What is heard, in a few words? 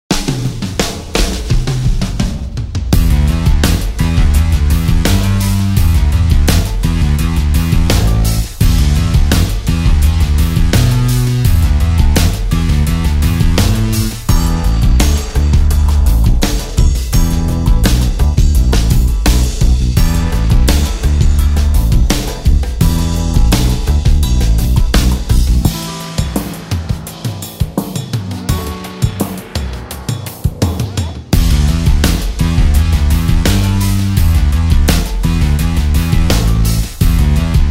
DUB STYLE